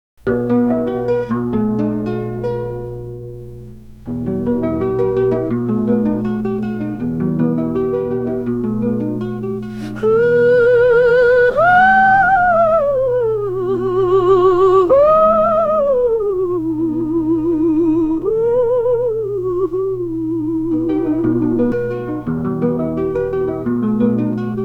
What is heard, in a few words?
soprano ooooooo